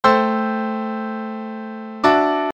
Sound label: Simple Harmonization